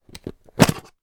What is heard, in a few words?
animals fish food flakes plastic tub lid remove